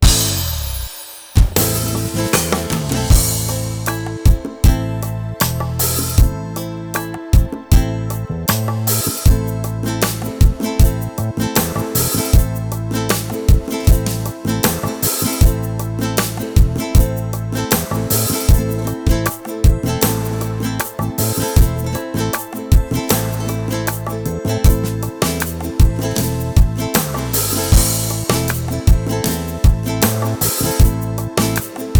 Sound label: orchestra